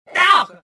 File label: pain, rodian